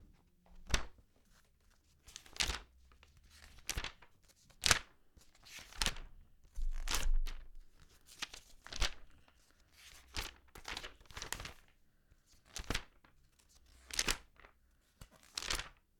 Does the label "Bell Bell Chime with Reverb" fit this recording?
No